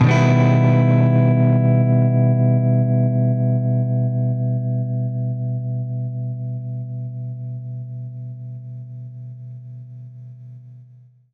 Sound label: Baritone Chord Emin[maj7], Guitar Chords; undercover samples